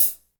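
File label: hi hat; Best Service Gigapack 2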